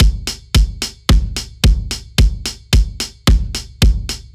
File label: Steppers